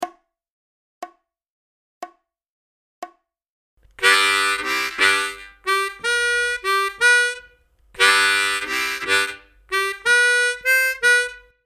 Solo Rhythm Harmonica Example

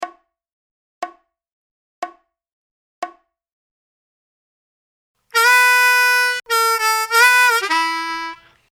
Lick Slow, harmonica

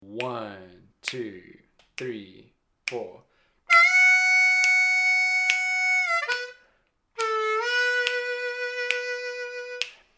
Lick, harmonica

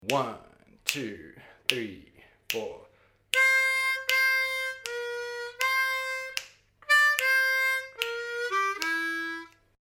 Chug Them Blues Licks Lick, harmonica

